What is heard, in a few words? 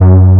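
brass G1, SYNTHESIZER analog brass; AKAI S6000 CD-ROM - Volume 1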